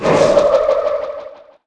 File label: corpse explode cast, spells